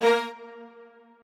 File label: strings